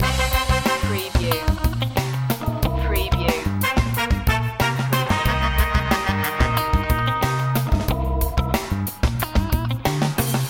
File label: sax machine loop